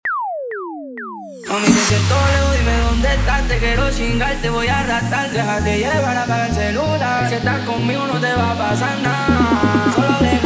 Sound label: 130 bpm